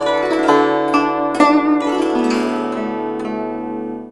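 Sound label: guzheng; Spectrasonics; Heart of Asia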